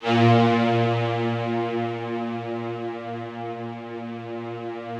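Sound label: Rough Strings A#1, Yamaha VP-1; Music Store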